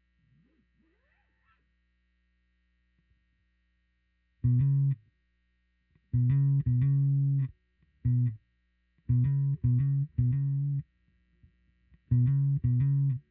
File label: new Bass